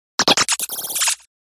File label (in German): Ruf